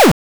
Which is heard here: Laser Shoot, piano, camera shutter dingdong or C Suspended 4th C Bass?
Laser Shoot